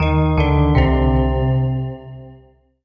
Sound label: Solo EPiano Negative; MUSIC EFFECT; startide